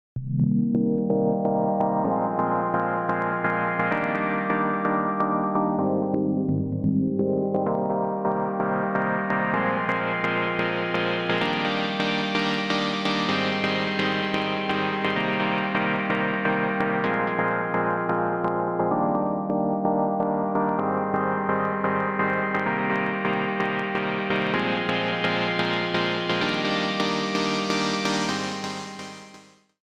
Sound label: Pad